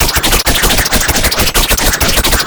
weapon dagger blade flurry loop

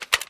health kit pickup

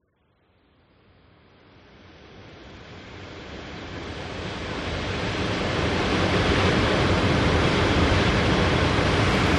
airdrop fall

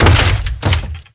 airdrop crash

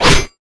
weapon dagger stab strong

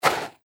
player walk snow loop; footsteps

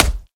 axe hit

